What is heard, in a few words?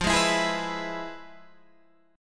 F Minor Major 7th Flat 5th